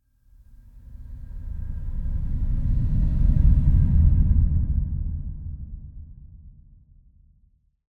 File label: Impact Distant Deep Dark Enter; Hollywood Edge; sound designer's toolkit v2